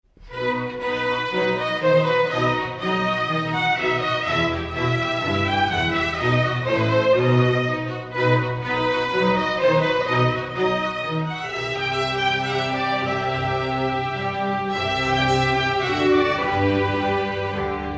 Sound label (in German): folkloristische Taenze fuer junge Streicher